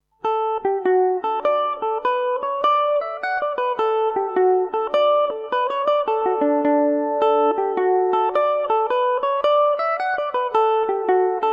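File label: D major revelation, Irish mandolin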